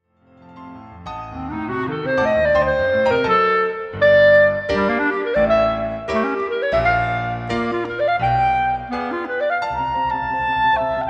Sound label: Clarinet Sonata Clarinet